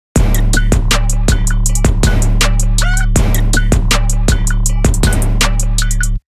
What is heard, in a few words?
trap kick